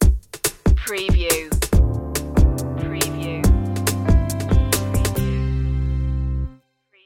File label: high spirits stinger